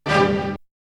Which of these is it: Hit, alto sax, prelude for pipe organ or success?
Hit